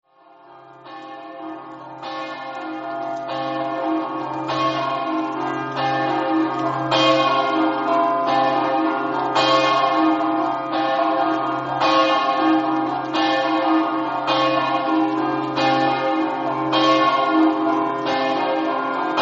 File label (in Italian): ronchis, campane